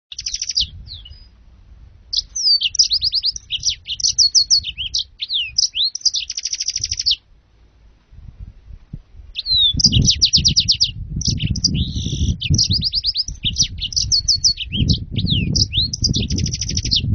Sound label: serinus mozambicus